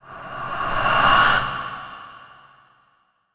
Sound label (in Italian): Verso Spettrale